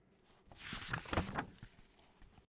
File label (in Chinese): 翻動紙張